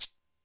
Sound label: Cabasa, Alesis D4 drum machine